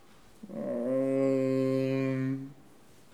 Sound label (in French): bruit animal